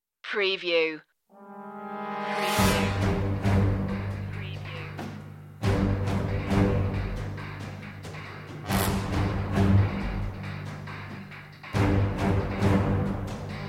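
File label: no drum kit guitars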